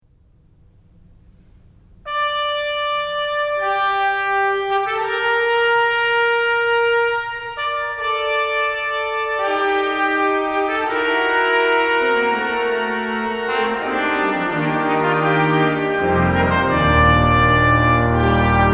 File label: Warriors, Trumpet and Organ; Martin Schmid Blechbläsernoten